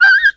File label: Dog Death; Playable Tutorial Level